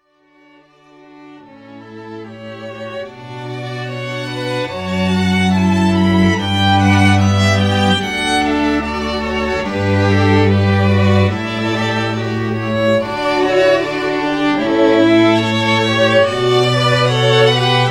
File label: Classical Trio